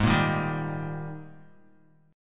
G sharp Major 7th Suspended 2nd, Guitar; strummed; chord; 3,1,3,0,3,x

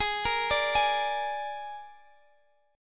Ab Suspended 2nd Flat 5th, Guitar Standard chord; arpeggiated; JGuitar

